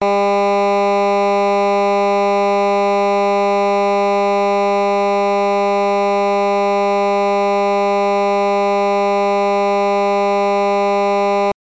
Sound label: air horn; ALTA POTENCIA; DP3000; rmt-4014